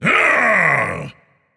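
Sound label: non-verbal yay; smite; atlas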